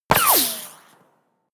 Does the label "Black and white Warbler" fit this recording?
No